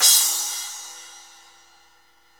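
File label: cymbal, Roland; Club-50 - Foundations Roland; Ext.Snare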